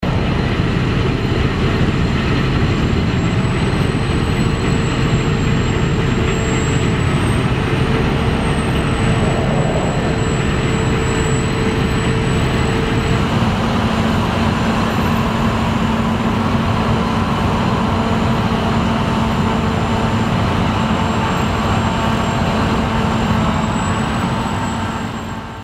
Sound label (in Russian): kombain claas